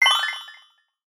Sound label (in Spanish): huawei y7 mensaje